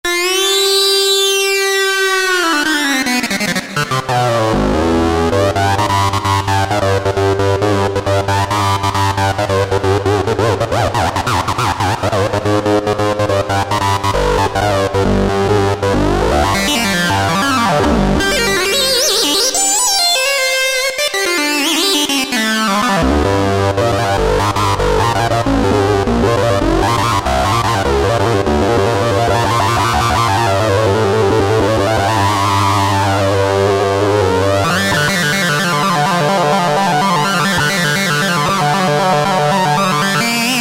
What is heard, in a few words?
Sync Lead; Yamaha EX5R; Factory presets